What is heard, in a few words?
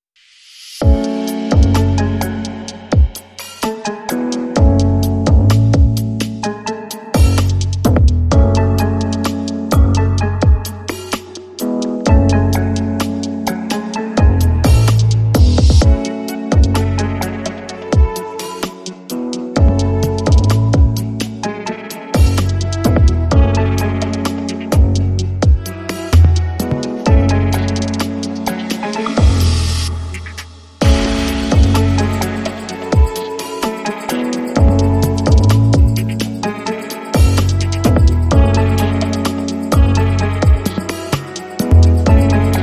Brooding Beat